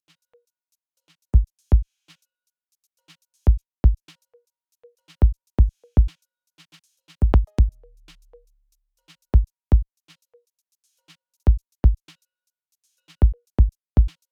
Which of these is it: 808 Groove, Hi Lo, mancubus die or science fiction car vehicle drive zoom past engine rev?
808 Groove